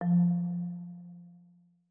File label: UI, Home Screen UI